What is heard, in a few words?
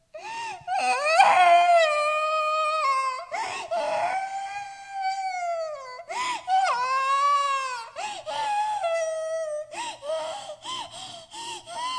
cry girl; 泣き４ （子供）; 小さな女の子的な泣きその２; 泣き声